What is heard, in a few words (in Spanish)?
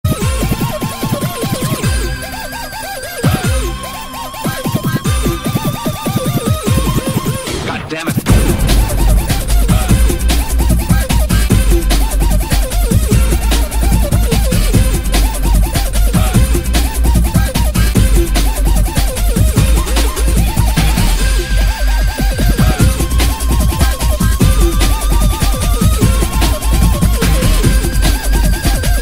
breaks troneta